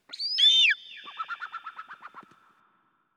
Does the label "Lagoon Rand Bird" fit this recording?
Yes